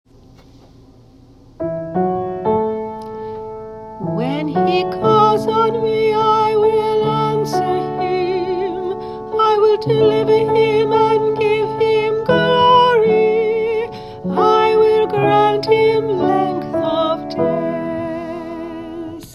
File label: Entrance Antiphon; 1st Sunday Lent